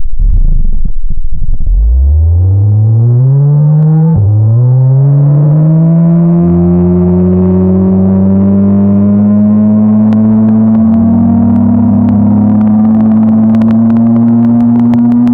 a start up of, engine

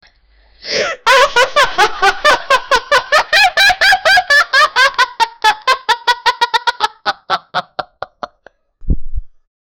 laughing, giggling

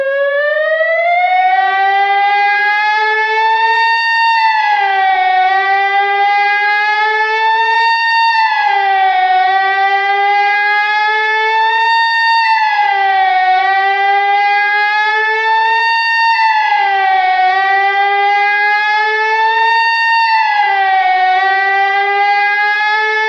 apocalypse nuclear attack

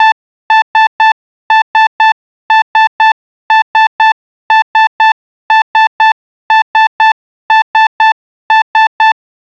three short beeps, alerts

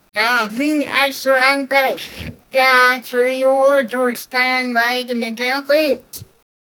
punch on wet flesh with, bone crack

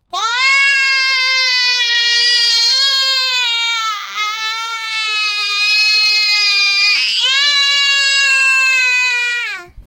child cry

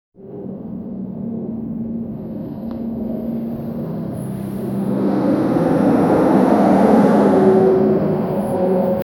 food trolley rolling in a, air travel

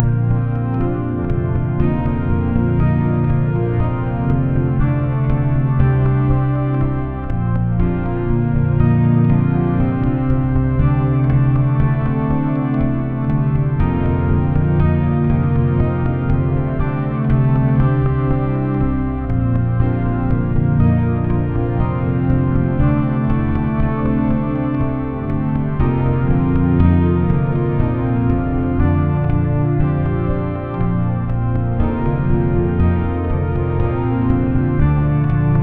background music for 2d, post-apocalyptic

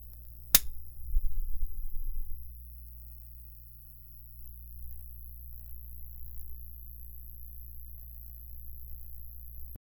an old fluorescent bulb

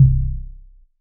core tone c pure electronic

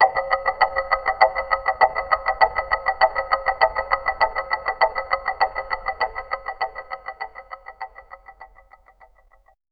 slow and echoing ticking, clock

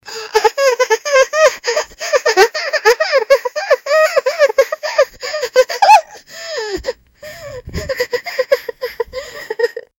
puppy crying

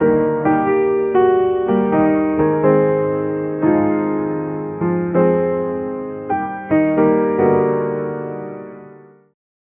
historical piano like; classical

